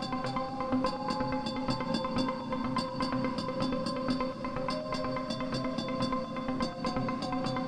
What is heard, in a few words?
freespin music loop